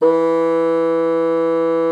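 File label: bassoon forte E3; Xsample VOL-6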